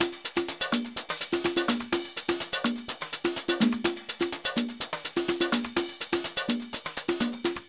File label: Nova beat top layers, 125 Beats